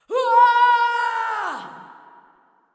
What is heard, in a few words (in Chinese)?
女吼叫; renlei